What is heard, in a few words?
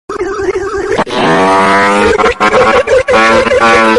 brain fart sound